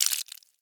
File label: Blood Squirt Spill; Haunted Bloodlines; SFX Producer